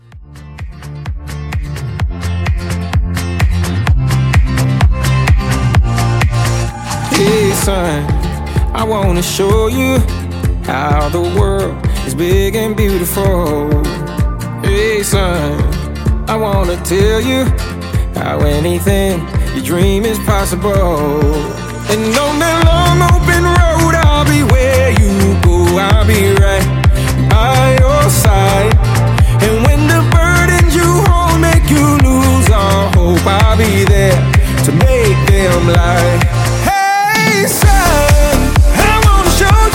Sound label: Club, 128